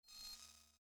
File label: button cancel click